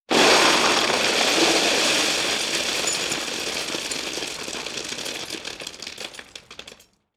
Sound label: Rubble Fall